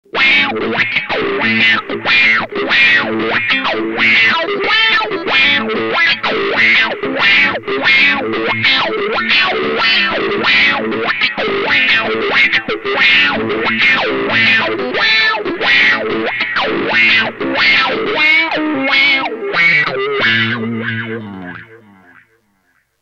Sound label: Blackface 212 Wah Delay